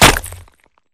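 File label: Bullet Light Ballistic Concrete impact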